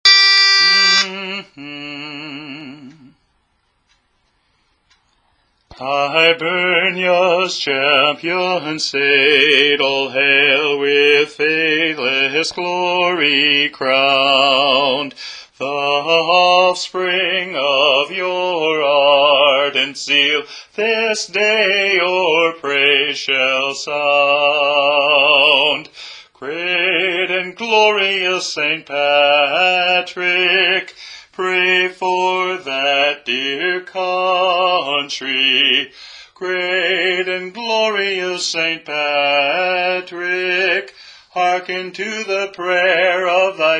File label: Hibernia's Champion Saint, All Hail (Lead)